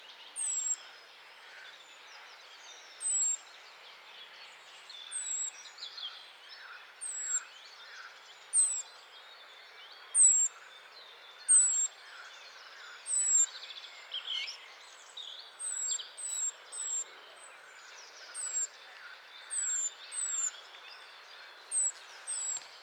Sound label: Sylvia atricapilla; SETE